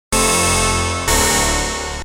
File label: Gong